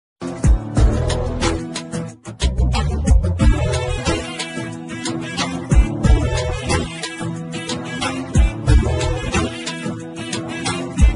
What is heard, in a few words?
Theme Music Ringtone; Ithikkara Pakki